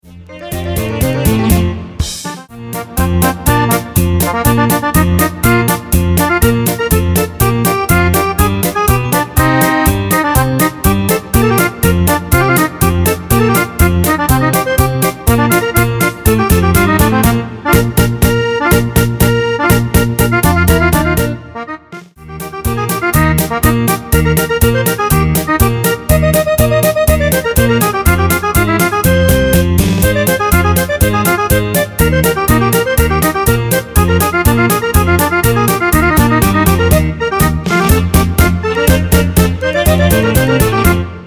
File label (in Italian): Fisarmonica Polka Canto